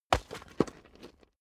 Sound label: stone stone drop